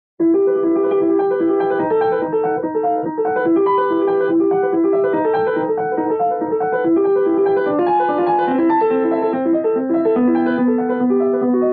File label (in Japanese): ピアノ; ピアノ発表会おすすめ; アジタート; ブルグミュラー; Agitato Burgmuller